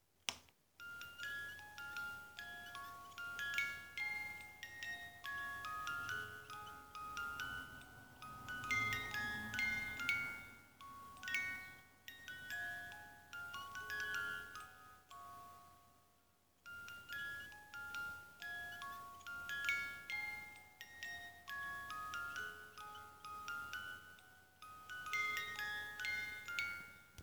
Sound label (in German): wal spieluhr